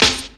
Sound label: Snare, Percussion Hits, Snares